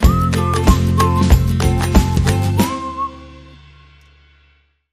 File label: Ukulele, Sting